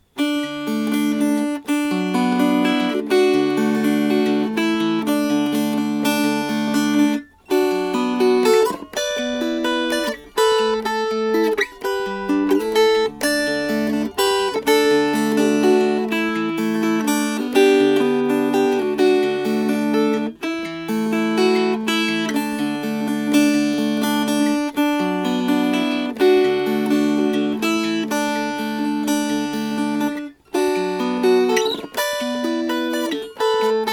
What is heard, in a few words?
Fingerpicked, Dulcimer; Huntsman's Chorus